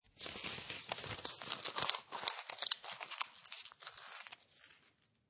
Paper Crumbling, Paper crunch, crunch paper, crumble paper in hand; Classroom Sound Effects; Schools; Lynne Music